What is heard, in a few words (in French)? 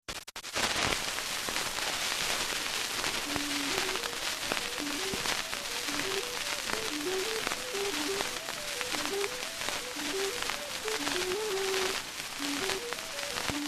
Clarinette; 5 inch Berliner; Freischuetz